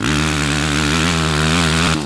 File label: power, Engine